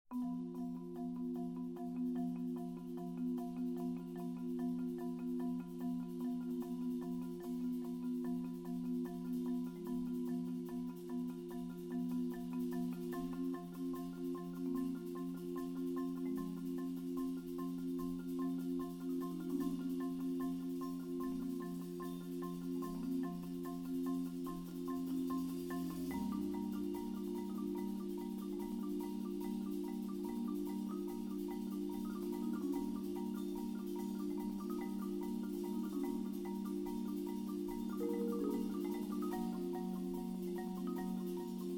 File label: Walking Mallets; Global Percussion Network